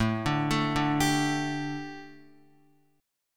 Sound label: A 7th Suspended 4th Guitar, chord; A7sus4; arpeggiated; JGuitar